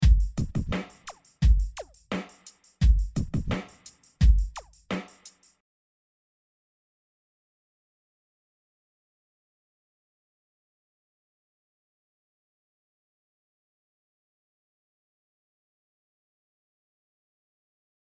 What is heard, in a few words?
Vocals; Two 4 Seven